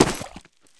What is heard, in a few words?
wet, footsteps; Garry's Mod; tf2